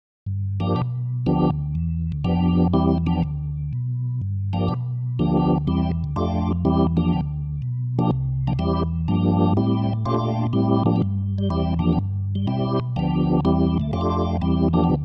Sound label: B4 Clean